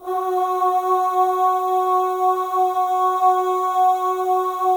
OH, M+F; Spectrasonics; Symphony of Voices; M+F OHS

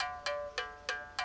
mouth bow, Africa

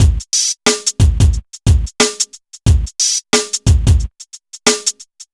BPM 90; AKAI S-Series CD-ROM Sound Library VOL-4

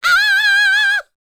D BIT, Vocal R&B Dance

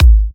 Kicks G#; markus hakala

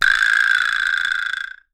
VIBRASLAP, LATIN percussion; Voice Crystal - Steve Reid Definitive Percussion; LATIN SET

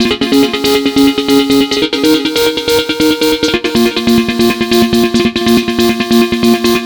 NRG Arp, trance

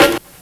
SD, Ragga & Dub Reggae; USB Soundscan vol.27; AKAI

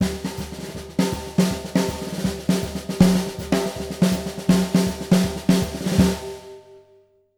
160 BRKBT; Univers Sons; Jazzistic

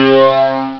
Korg Synth Flange C2; rare gems

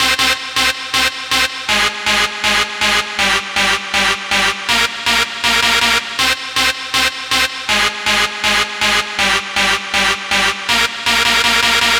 Dance Synths, Hardcore Loops, Synth Loops

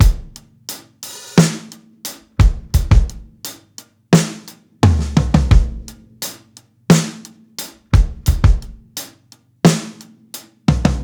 HALF TIME, breaks, drums; Basement drums vol 2

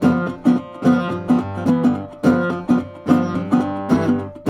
Blues Guitar, riff; ILIO; Fretworks; Blues Guitar Samples; SIMMS RIFF

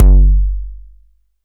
kick drive

